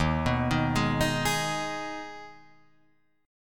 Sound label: D sharp Suspended 4th Sharp 5th D sharp, Guitar Drop D; chord shape